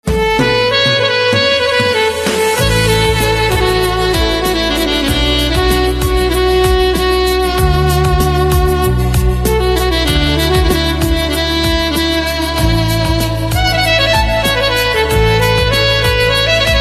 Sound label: Saxophone